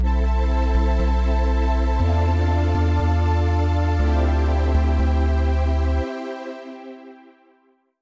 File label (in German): Disharmonie mit Bass